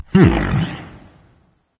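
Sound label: thinking, nonverbal; smite; voicepack; khepri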